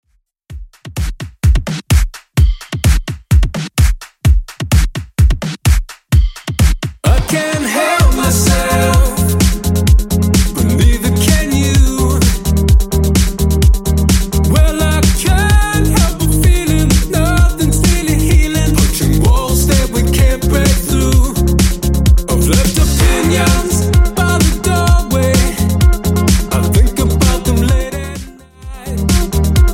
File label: 2ks Redrum 128bpm